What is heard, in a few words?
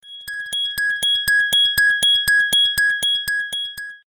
android alert tone